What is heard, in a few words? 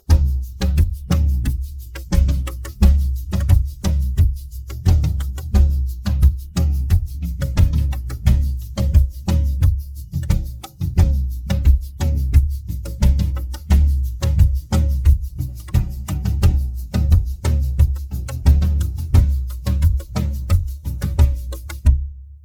pattern jungle slow, drums